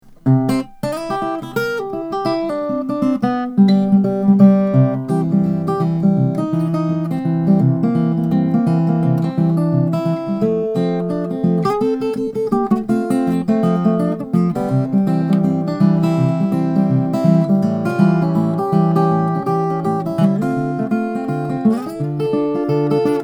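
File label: Grand Abbey parlor Brazilian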